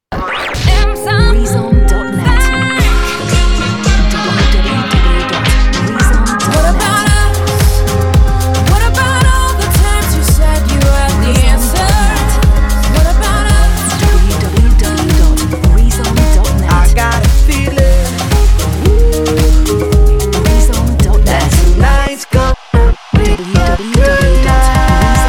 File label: Montage 112bpm; M105